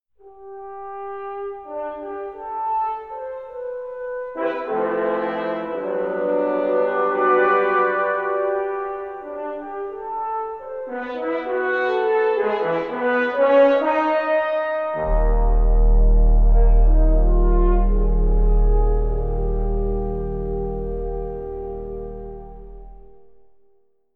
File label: fanfare; the ladd company